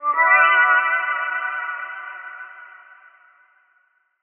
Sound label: pad slopes Bm; Melodies; One Shots; Slate; AURORA Sample Pack